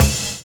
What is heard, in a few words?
CRASH FIL; Univers Sons